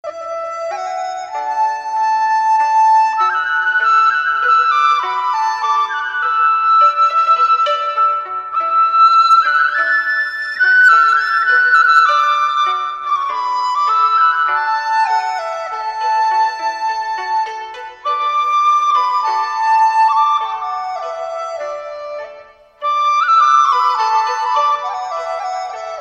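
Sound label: Chinese flute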